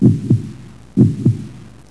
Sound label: hartbeat; quake2